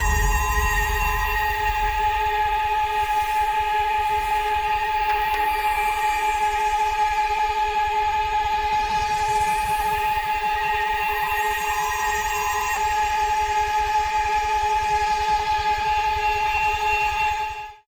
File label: intense